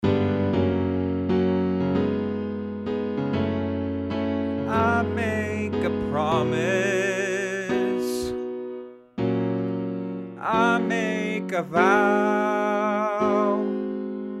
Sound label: VOCALS